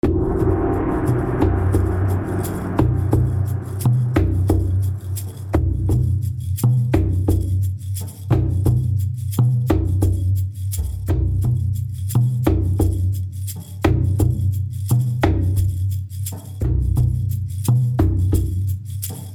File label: 94 BPM Native American